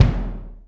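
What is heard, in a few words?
bass drum mono, drum machines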